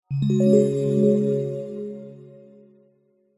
multimedia game sound relaxing game soft twinkling chimes complete finish tone